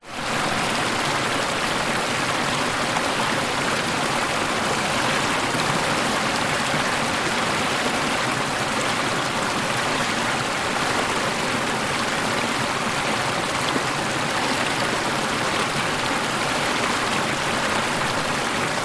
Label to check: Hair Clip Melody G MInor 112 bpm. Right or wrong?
Wrong